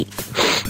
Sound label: sniff